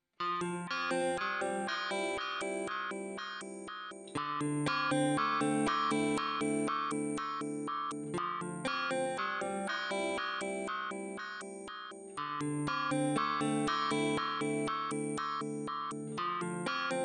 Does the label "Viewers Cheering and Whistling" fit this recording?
No